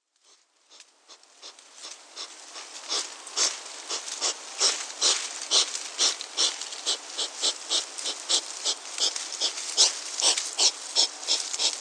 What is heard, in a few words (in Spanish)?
Erinaceus europaeus - Erizo europeo